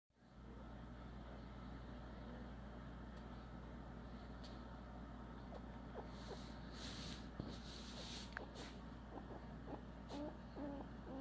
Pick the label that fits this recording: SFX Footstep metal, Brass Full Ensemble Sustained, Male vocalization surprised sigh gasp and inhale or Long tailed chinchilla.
Long tailed chinchilla